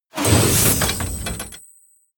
sci-fi plasma cannon load

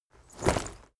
leather armor movement

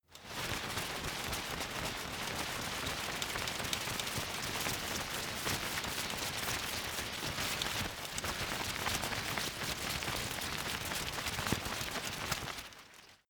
umbrella big shake rustle

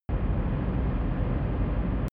drone spaceship large

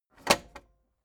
tape deck close heavy

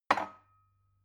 glass put down cupboard wobbly

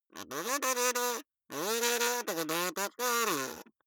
kazoo talk prattle

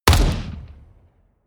shoot close

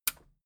metal button radio push enable

